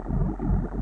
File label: AMB